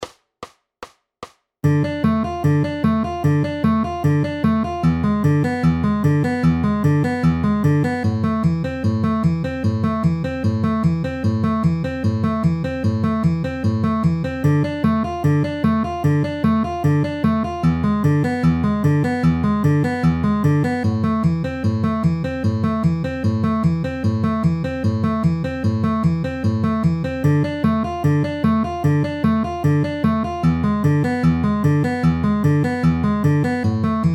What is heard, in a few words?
Dm E7 Am Am inner outer at 150 bpm